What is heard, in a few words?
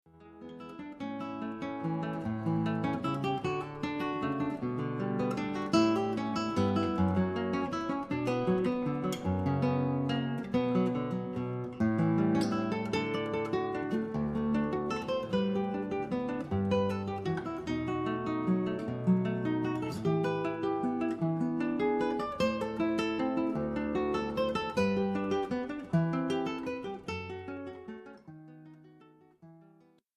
courante, guitar